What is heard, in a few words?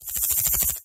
Recharge